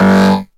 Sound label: Synth Voc; Vengeance Samples; VEE Synth Shots